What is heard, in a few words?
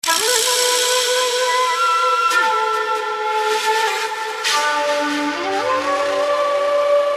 Ogre Attack; Okami